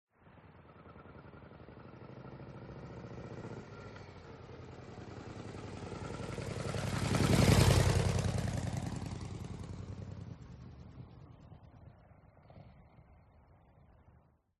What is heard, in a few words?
MOTORCYCLE Harley Drive By Slow; TRANSPORTATION